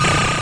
c64 sid, Commodore 64 8bit SID Drums; LO FI and 8 BIT KITS

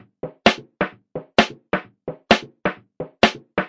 130 bpm just some snares

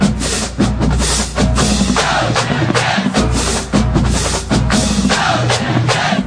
076 georgia tech breakdown, drum loops and breaks

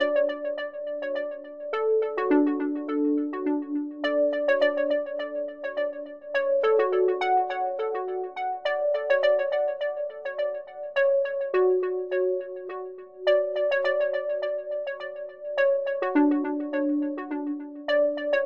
104 happy melody, synth and electronic loops; melodic loops; superheart

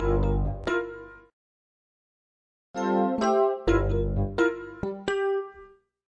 126 new age piano funk; KEYS ORGAN PIANO LOOPS

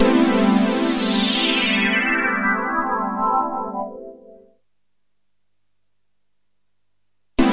mks pad; Pads

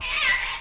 silly elec fx

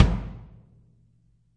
real kick; REAL LIVE KITS

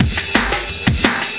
173 rock n roll dnb; 161 to 180 bpm

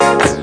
vocoded note; Melodic Stabs and Hits

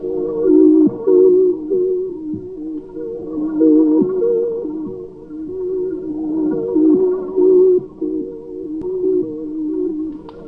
142 womb ambience loop; SYNTH AND ELECTRONIC LOOPS

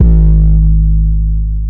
Clean 808; Khezie 808s

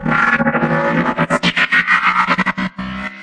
transforming robot getting started; Transforming Robot Sounds

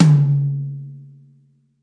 Mid Tom, classic hip hop; STYLE KITS; Classic Hip Hop Mega Kit

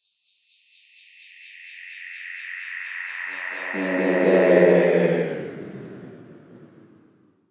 grainy sweep; REMIXABLE COLLECTIONS; Ze Franks Pain Pack; pain pack sweeps and fx

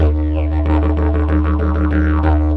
didg groove, didgeridoo; amani friend; WORLD

